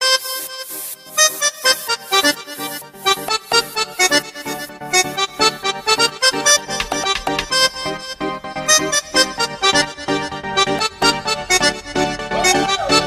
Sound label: Samba